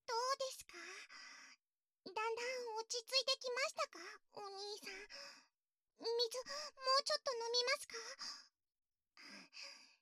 请你放空大脑，感受耳朵传来的快感……（掏耳，舔耳）, ASMR

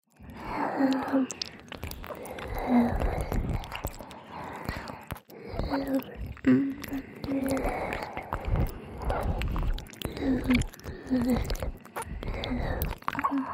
雙耳 舔舔; 附錄迴圈（自慰輔助）; 耳邊

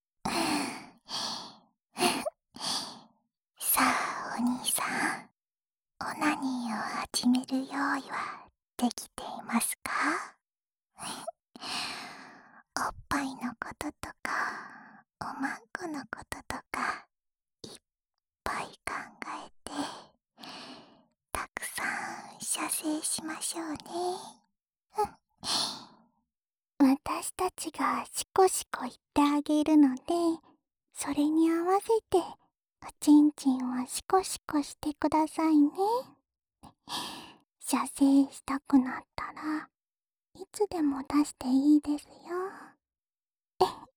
双侧舔耳循环音轨; 栉形与稻丛的自慰辅助用

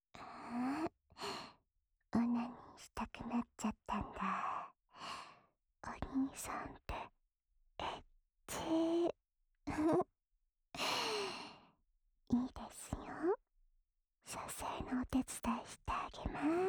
自慰辅助用 稍含唾液的舔耳 左耳

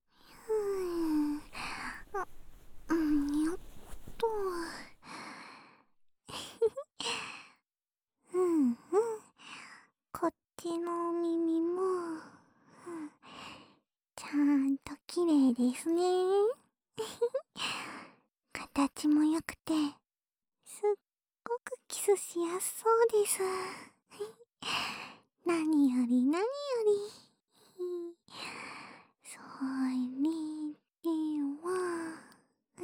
咕啾咕啾地搅动左耳 舔耳，左耳, ASMR; 这次来咕啾咕啾地搅动左耳♪; 舔耳

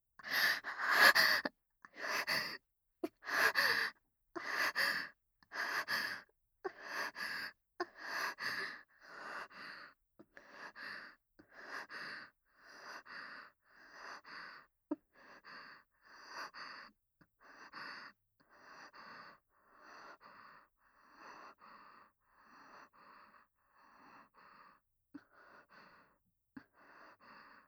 吐息

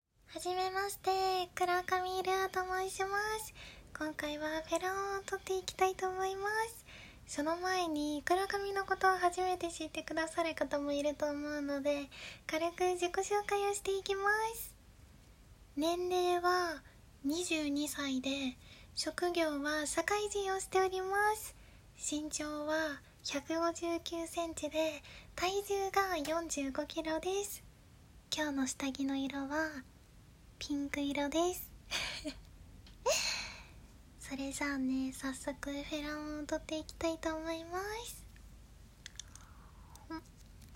侍奉口交; 无剪辑ver.

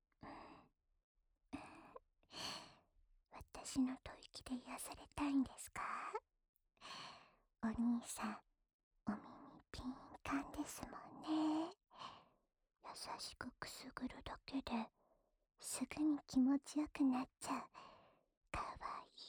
呼呼耳朵 右耳, ASMR

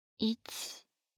骰子

